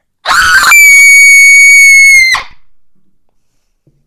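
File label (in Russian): душераздирающий крик женщины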